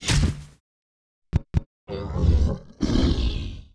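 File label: Turtle Idle; Gamigo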